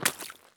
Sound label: jump puddle; Escape from Tarkov